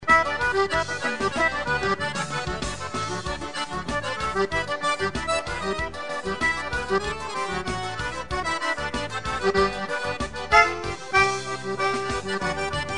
acordeon xote solado

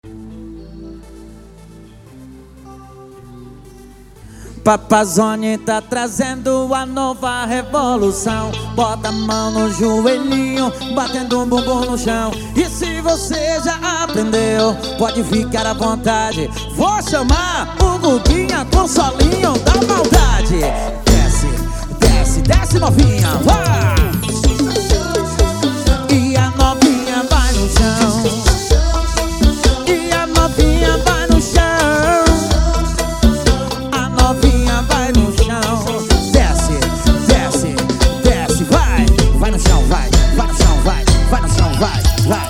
solinho ao vivo; ao vivo em baixio

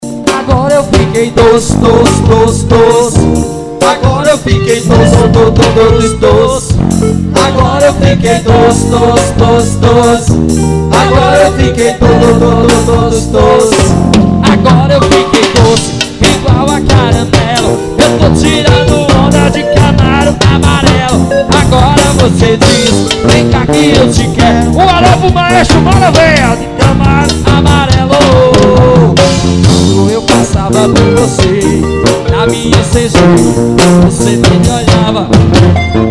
forro swing

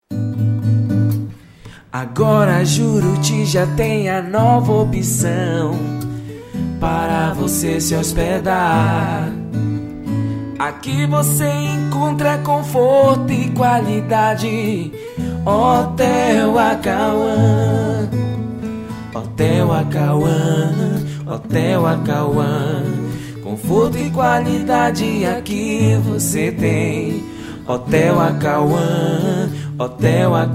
jingle hotel; acaua

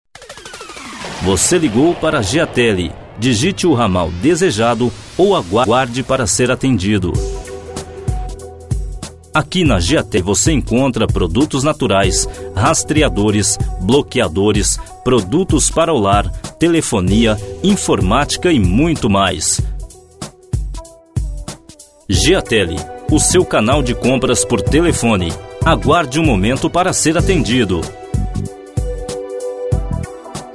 espera telefonica

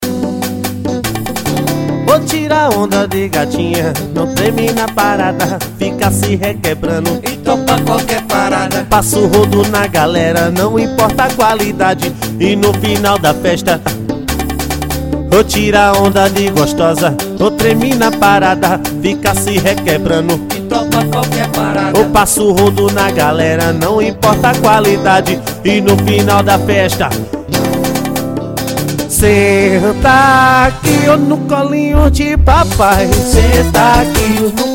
pancadao eletrico swing

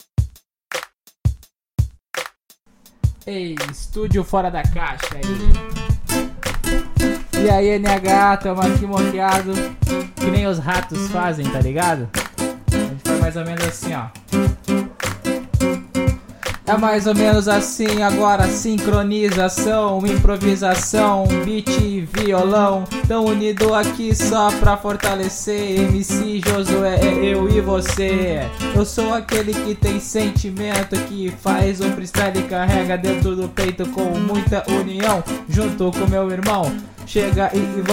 freestaly violao

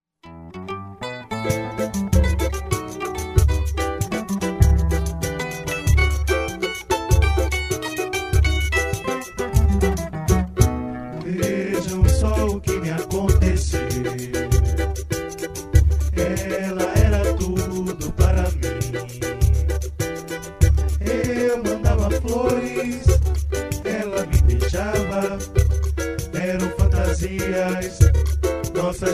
samba e o bandolim

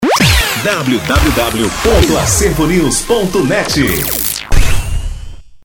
forro batida quente

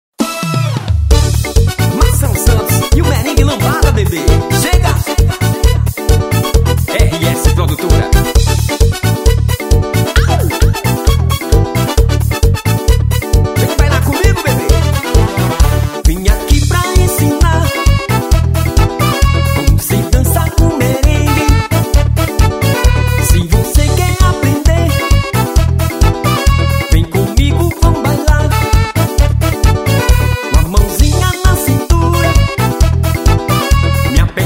merengue lambada

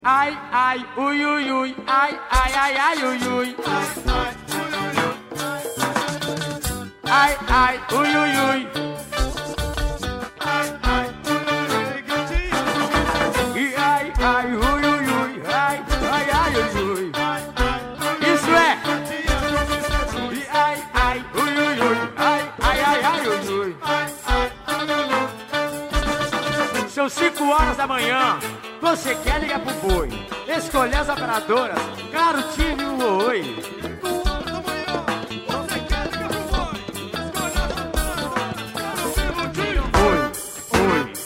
banda swing tecnomix

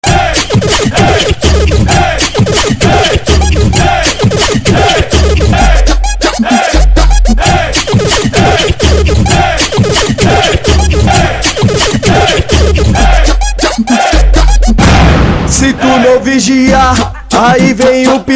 apocalipse gospel funk

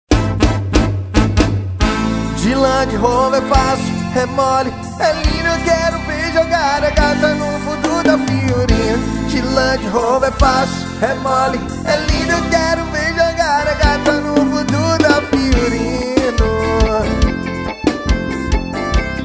forro

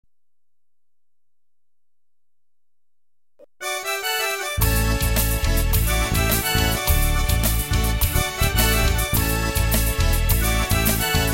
forro

